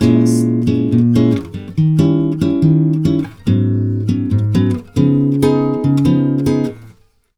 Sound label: CHORDS, Brazil Bossa; USB Soundscan vol.16